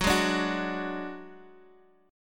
Gb Suspended 2nd Sharp 5th, Guitar, Half Step Down